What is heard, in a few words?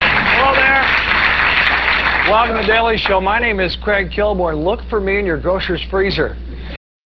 freezer